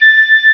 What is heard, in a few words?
flute A6; Star Trek JR Audio